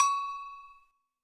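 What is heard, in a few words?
cowbell, drums; Yamaha SY85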